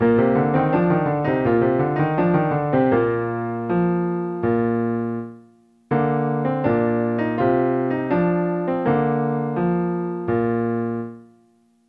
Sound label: dotted 8th note in B flat